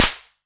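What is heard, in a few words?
Olson SD C, snare drum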